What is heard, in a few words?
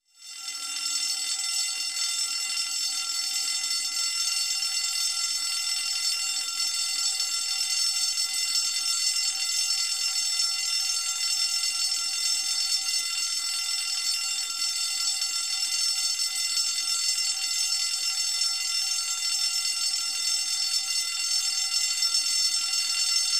Fire Alarm Bell